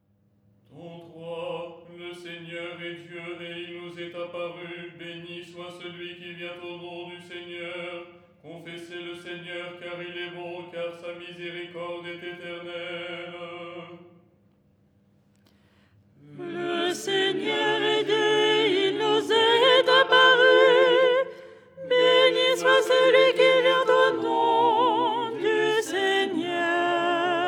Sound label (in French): soprano; ton 3